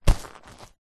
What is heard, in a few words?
leather handbag or pouch grab